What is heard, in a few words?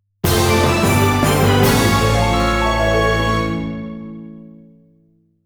Victory! Victory Jingle; Gloria Union